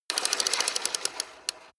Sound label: plastic mechanical draw rattle